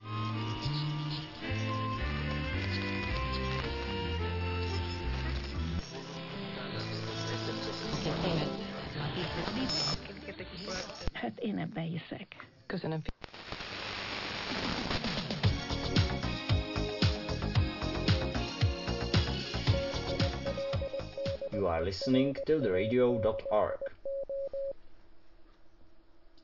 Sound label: jingle intro